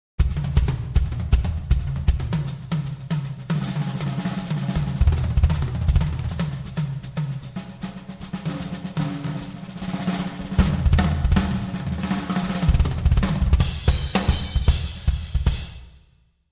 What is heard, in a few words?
fills